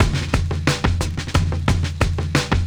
Electronic, Drums & Percussion

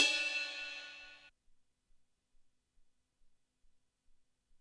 Cymbell, ELKA X1000

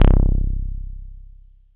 Dance Floor Bass, Multisample Basses

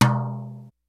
JAZZ KIT; Kid Nepro; Elite

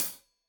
Hat Tight, Hi-Hat; Deeply Sampled Drums

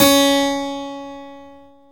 KEY HRPCR, Roland; Roland - LA Composer vol. 1

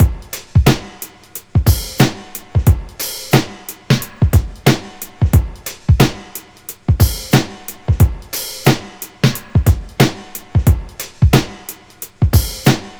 90 Bouncey Phasey, Breaks; Lockdown Breaks

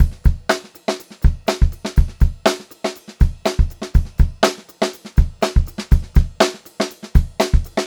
Funk-Groove Drumloops, 122 FUNKY, R; USB Soundscan vol.38; AKAI; 90 s Sample CDs

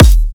clip vinyl kick

